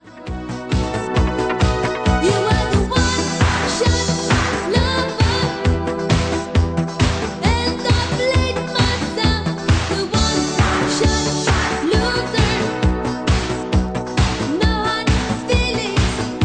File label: vocal